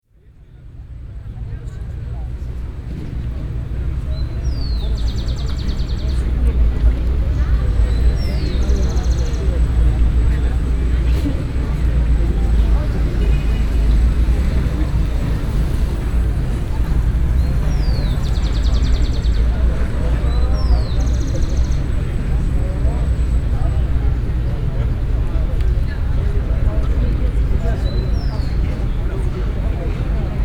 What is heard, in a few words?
bogota plans sonores